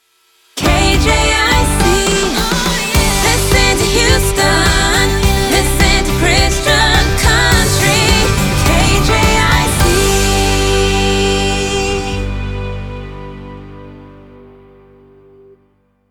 Jingle, 105bpm G, Slogan; KJIC

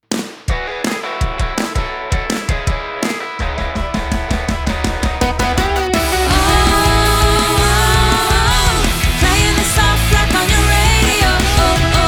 Jingle E Key 165BPM; Houston Radio Platinum

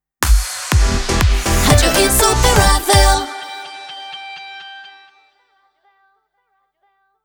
Jingle 122bpm G Sting; Radio Insuperavel

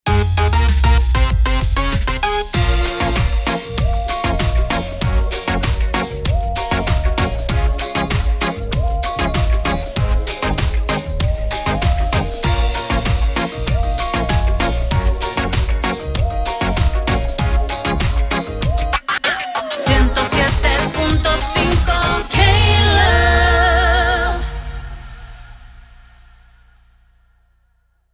Sung Jingle, 97bpm, A Minor, RAMP; KLove

